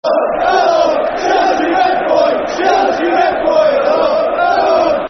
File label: Football Chants (Soccer Songs), Liverpool; Chelsea Rent Boy's; Liverpool Lfc